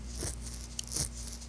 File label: scratch